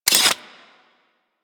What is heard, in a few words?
Blade Open, Weapons; Mios